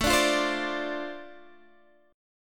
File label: D-Minor 7th A, chord